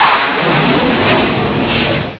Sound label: Jet; Mosaic Magic